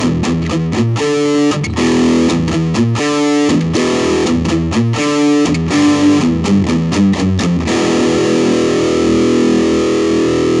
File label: esp gain full